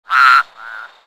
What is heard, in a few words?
Bayou Hungry frogs Amb; The Princess and the Frog; wii windows; Target Hungryfrogs